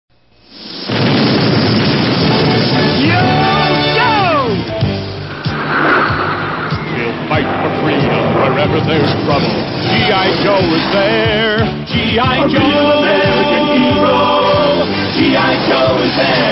cartoon ringtone

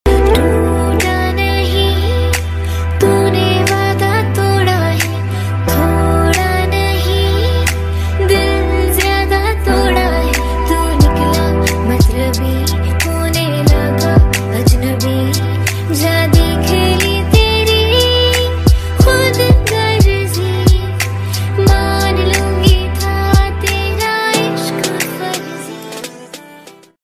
ringtone, bollywood ringtones

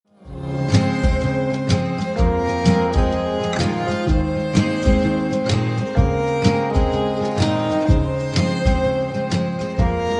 cool background music tone; city lights

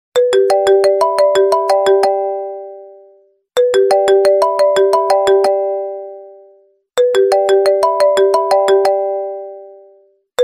apple ring ringtone

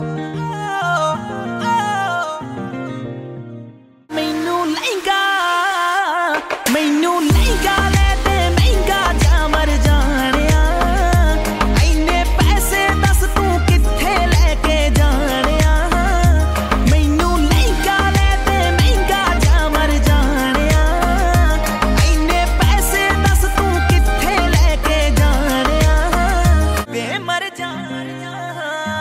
bollywood punjabi song ringtone

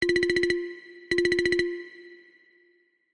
htc h5 ringtone

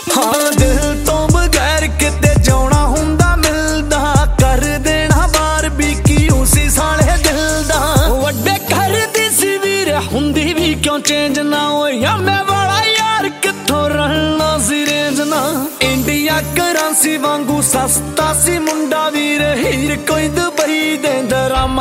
punjabi ringtone

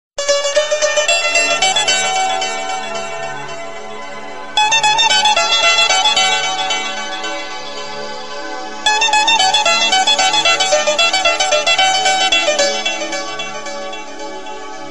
famous guitar ringtone

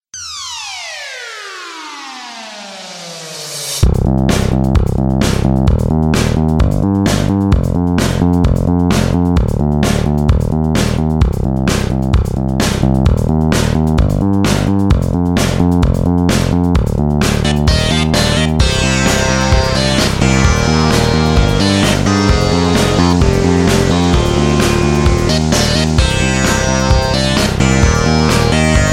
disco electronic music ringtone

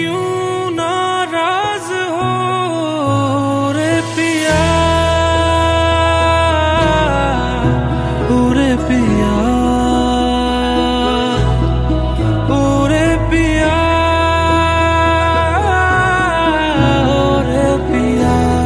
new hindi ringtone, bollywood ringtones

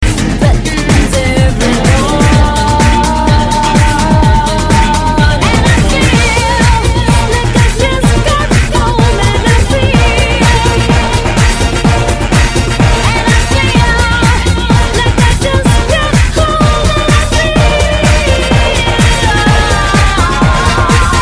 remix english song tone ringtone

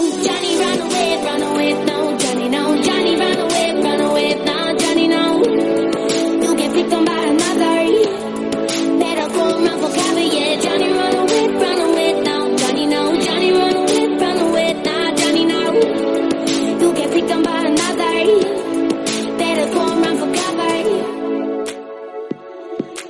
english musical song ringtone

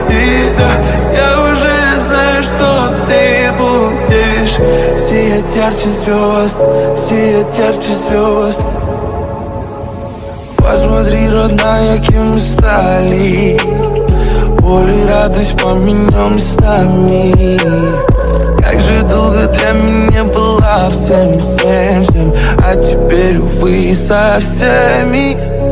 love romantic english ringtone; english ringtones

